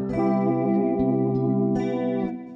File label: Stabz, FX